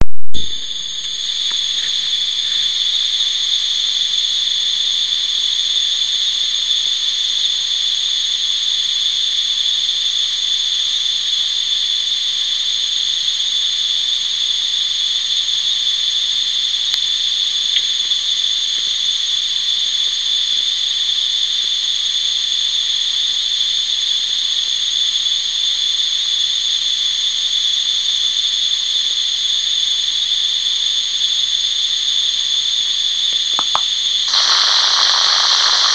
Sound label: ultrasound microphone recording; radio at 612 Khz (AM)